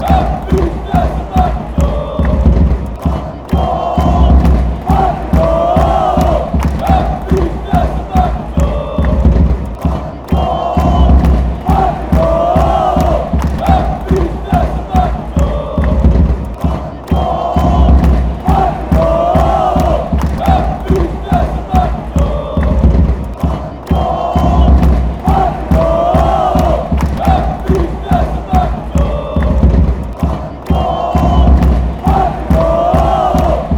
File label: chant, fan chants; vitesse